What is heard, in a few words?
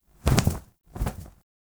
Owl Finisher Cycle; Warframe; Duviri; Animals